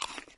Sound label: carrot crunch; Bunnicula - Juicy Bites